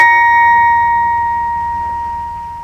Glocke, Glockenspiel

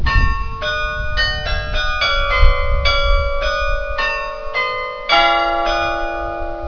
glocke feldgraben; Glockenspiel auf den Spittelwiesen; eisernen Glocken; Oberharz; 25 eisernen Glocken; TU-Clausthal

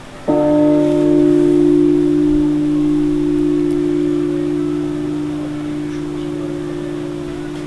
osterode stahl klein, Glocke Stahl; Marienkirche

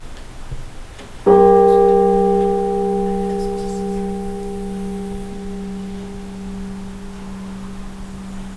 wildemann stahl klein, Glocke Stahl; Wildemann, Maria Magdalena; Marienkirche

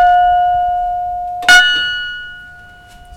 Glocke, Glockenspiel; Glocke 19

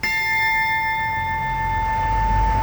Glocke, Glockenspiel

